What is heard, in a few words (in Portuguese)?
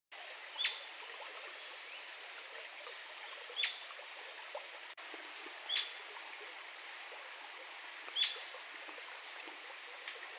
Canto do maria leque do sudeste